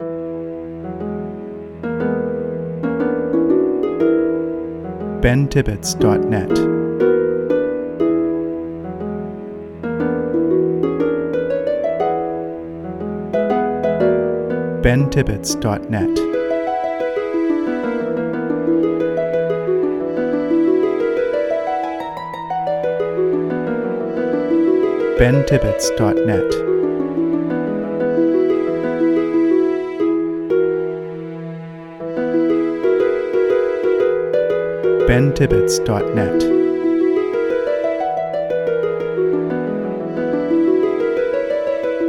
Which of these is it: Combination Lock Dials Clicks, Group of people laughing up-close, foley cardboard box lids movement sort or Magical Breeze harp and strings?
Magical Breeze harp and strings